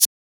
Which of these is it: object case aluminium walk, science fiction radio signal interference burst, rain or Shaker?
Shaker